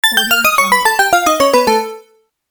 slot sound

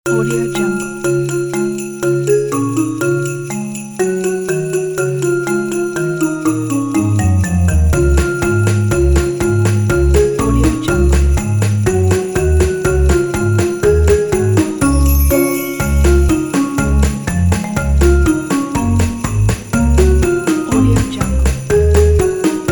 christmas jingle bells

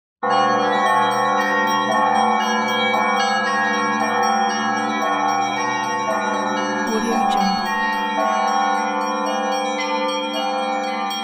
church bells

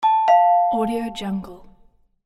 doorbell

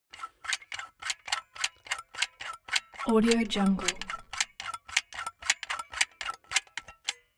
object tool silicone gun empty pump medium; smartsoundfx

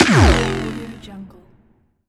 laser shot

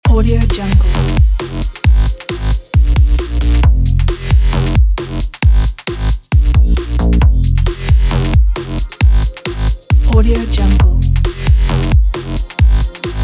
breaks loop

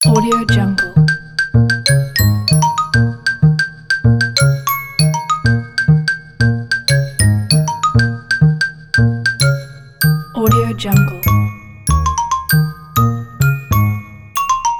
running bells; emanuel-abrudean

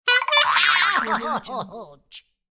cartoon horn blow with spring boing

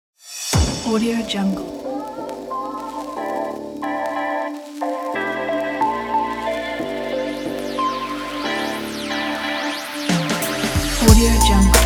fashion hip hop upbeat

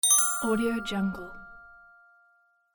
clean bell

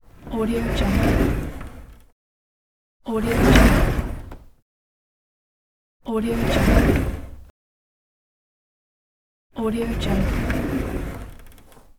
roller door; soundsground

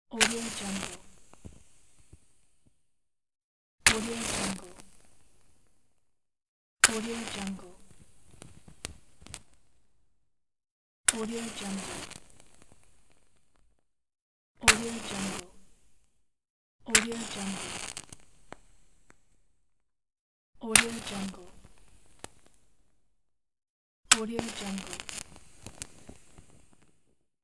match strike

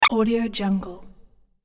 cartoon play collect

